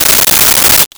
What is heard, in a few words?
Tape Measure; Tools; 1001 Sound Effects